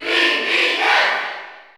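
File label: Cheer Spanish, crowd; SSBU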